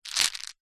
food candy sweets mint lozenges in plastic tub short movement